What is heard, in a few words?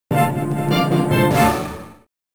Wasteland Jingle; Ultimate Pinball Quest; Amiga